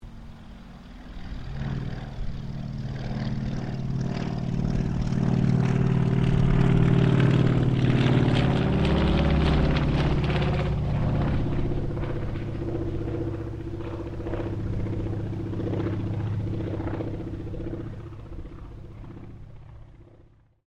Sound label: AIRCRAFT CAC WIRRAWAY PASS; CA-16 Wirraway; Airplanes (Sound Effects:Vehicles)